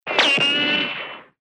ricochet, weapons

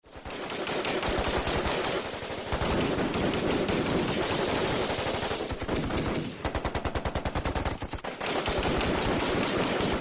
gunfight, weapons

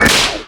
snap; Ed Edd n Eddy - Adromulus Pack

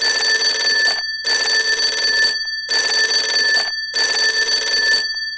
Telephone Ringing; Ed Edd n Eddy; Adromulus Pack

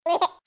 Baby Joy

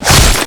foley impact; Ed Edd n Eddy - Adromulus Pack

